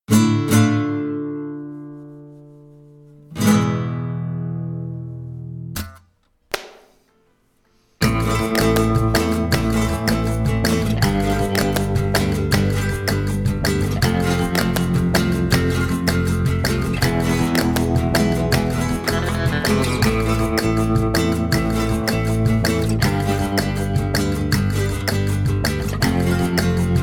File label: karaoke; Barabba ba